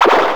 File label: Bacta Gun Bullet